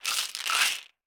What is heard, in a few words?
Medicine Bottle Shake; Medical; Blastwave